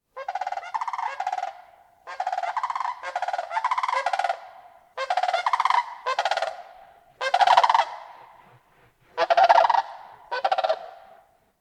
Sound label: sandhill crane; ringtone